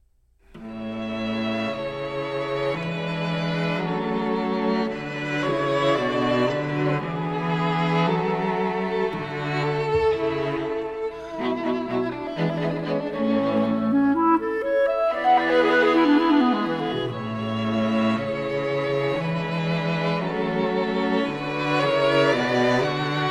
Quintet For Clarinet, Two Violins, Viola and Violoncello in A Major; Allegro; Chamber Works For Winds And Strings